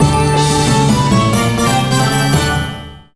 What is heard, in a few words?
VS FANFARE